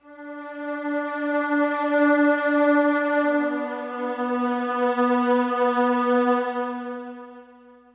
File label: synth, Hardcore Breakbeat; Big Fish Audio; Hardcore Breakbeat Action; Rhythms 4 Life 151 Ebmin